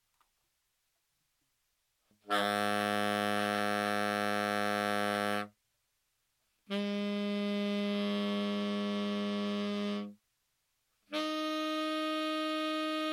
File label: Bb overtones tenor, saxophone